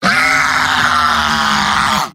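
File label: heavy pain critical death; vo; tf2; mvm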